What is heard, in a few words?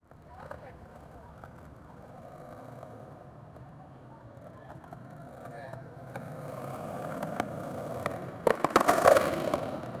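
skateboarding in a straight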